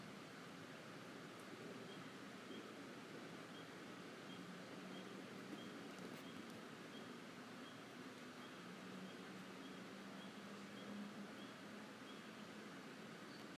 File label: Spring Peepers